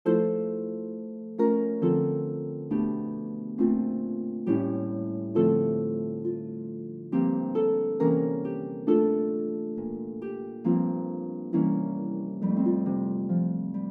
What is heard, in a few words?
Deck the Halls midi sample